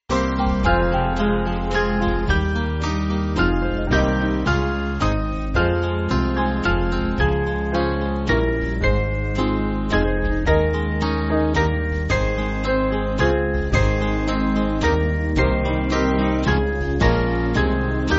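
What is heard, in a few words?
Band, Backing Files for Churches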